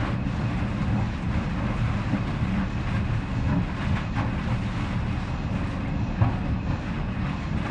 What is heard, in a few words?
swampland pipe; Titanfall 2